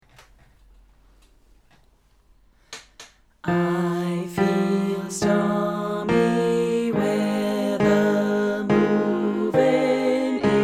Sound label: Tenor